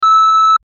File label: countdown beep high; sfx; teststrecke game